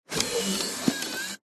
technology video game nintendo wii console disc insert